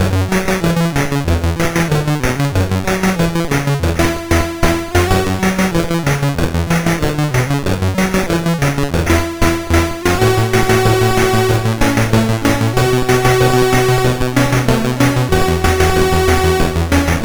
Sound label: Synth Sample III, CSG8580R5